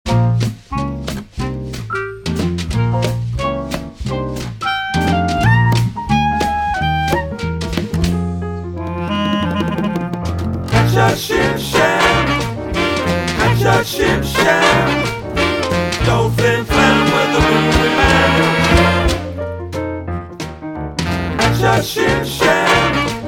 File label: TAP BOW; tap intermediate